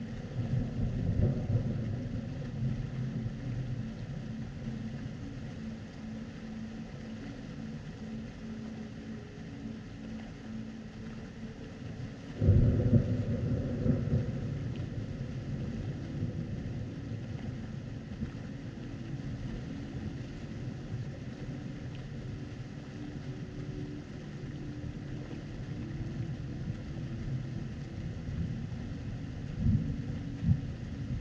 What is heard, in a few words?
Sounds of Nature White Noise; for Mindfulness Meditation and Relaxation